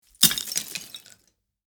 Small debris thrown on a rock pile; impacts

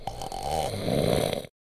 Man snoring violently short

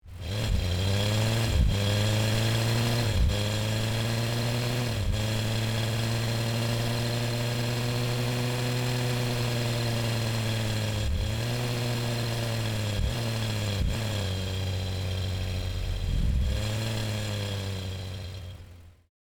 Car starting to accelerating and decelerating

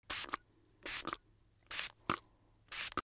Perfume pump sprayer

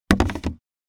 Bathroom trash bin lid close

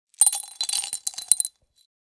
Glass medicine jar putting pills inside

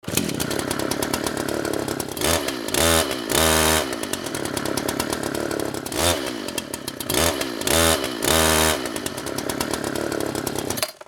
Chainsaw revving short

